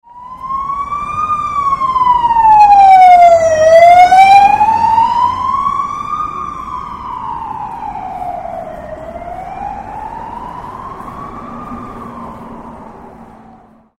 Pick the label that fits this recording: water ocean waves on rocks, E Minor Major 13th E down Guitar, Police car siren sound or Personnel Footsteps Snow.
Police car siren sound